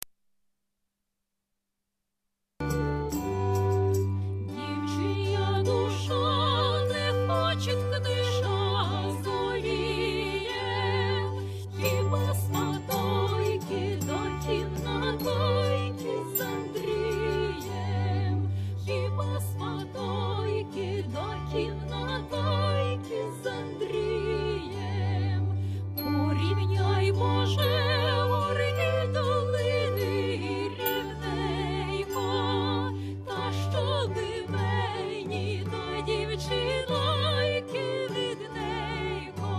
Ukrainian baroque song

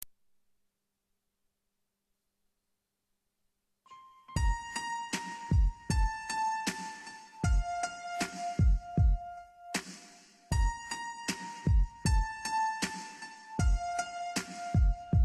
Ukrainian song, serenade

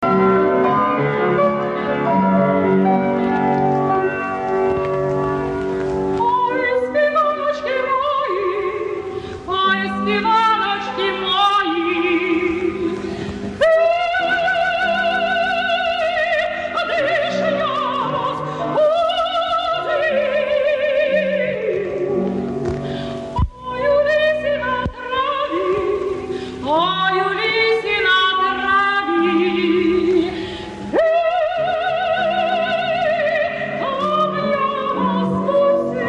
Ukrainian traditional song

